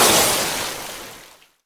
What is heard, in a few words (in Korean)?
[Hit] 물 떨어저유; 音MAD; 少女终末旅行; 소녀 종말 여행 음원팩